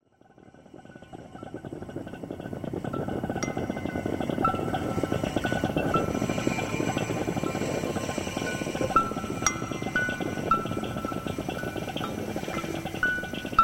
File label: chime thing; lolicore; Lost Frog Productions